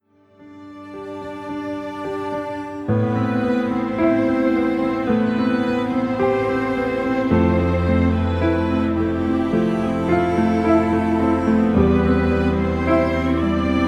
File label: Instrumental